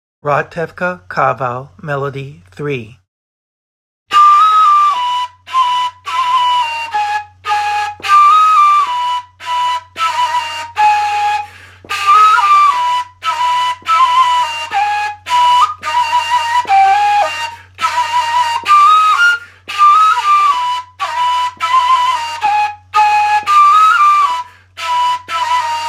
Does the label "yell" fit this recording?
No